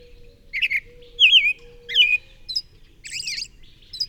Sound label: american robin